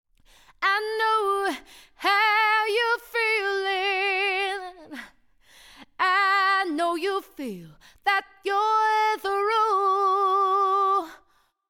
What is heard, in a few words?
Female Vocal LA2A